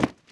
footstep run cement

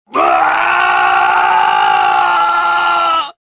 falling; Star Wars Jedi Knight II Jedi Outcast; XBOX; mp generic male

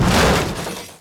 crush, Impact

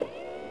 walk

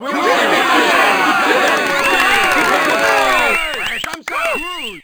goal, Crowd, French

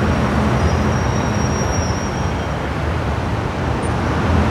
City Ambient Loop; Totaled; XBOX

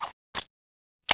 throw; Soldier Of Fortune II Double Helix; XBOX; m1911a1

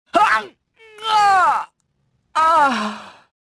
death; mothma

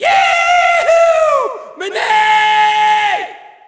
Shout, audience; UFC Tapout 2

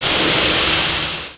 Steam; Finding Nemo; XBOX

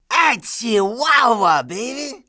HURT; TrueCrimeStreetsOfLA; XBOX

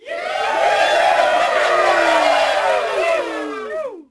CROWD POS HIGH; Cars; XBOX

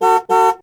horn

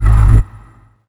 wrong; Stake Fortune Fighters; XBOX

